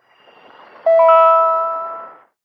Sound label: anomaly chime